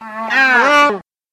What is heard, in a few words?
deer